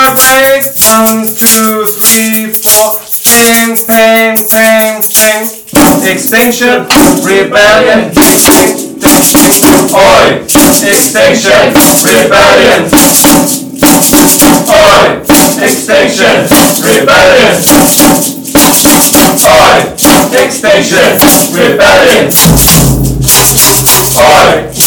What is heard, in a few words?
samba break; XR